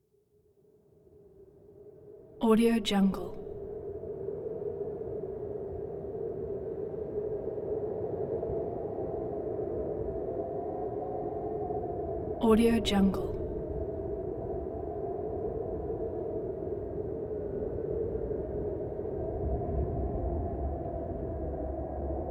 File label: spooky wind tone eerie hollow moaning howling; prosoundfx